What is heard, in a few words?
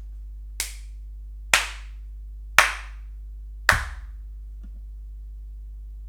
Clapping; Impulse Responses